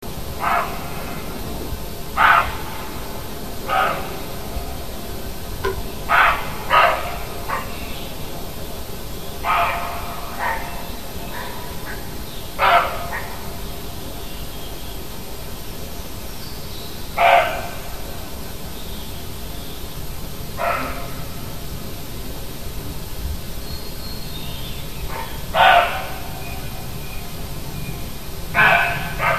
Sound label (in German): rehbock; hecke; biologie